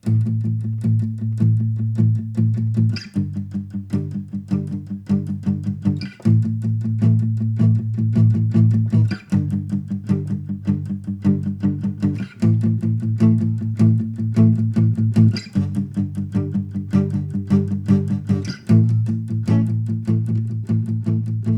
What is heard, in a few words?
instrumental